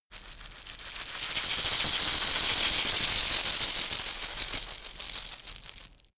shaker wood rough rattle dynamic movement

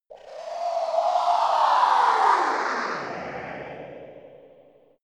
space passing fighting racer

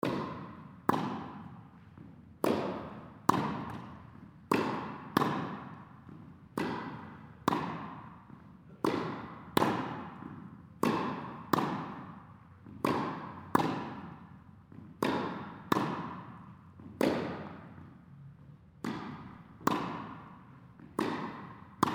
indoor tennis game

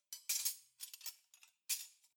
cutlery knives handle

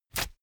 paper towel roll sheet tear up fast

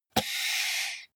computer mouse draw drop

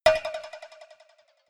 cowbell move muted hard

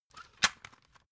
cd cover close light

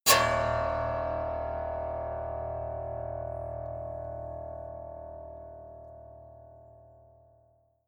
piano squeal

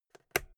old switch plastic robust

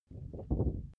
paper wobble low gentle

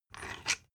thread screw remove

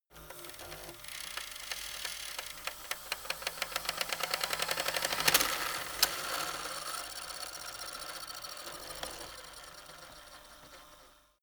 servo move rattle

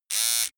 servo twangy

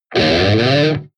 electric guitar distorted slide up

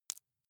wood chip small break creak soft

